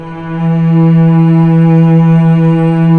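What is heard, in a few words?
cellos E3, String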